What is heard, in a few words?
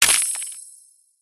extra moves destroyed; Candy Crush Friends